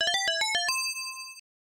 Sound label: COIN; '88 Games; arcade